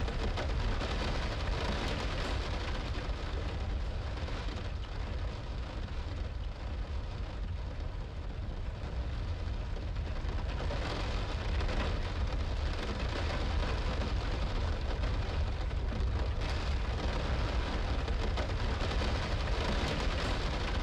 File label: soundscape